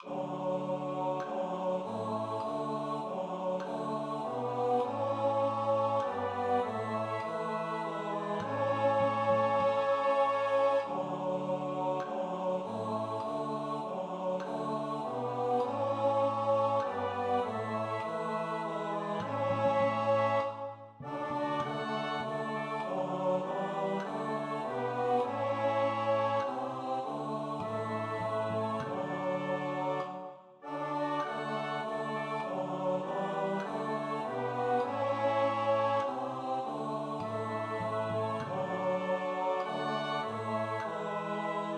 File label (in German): Tenor; Kantorei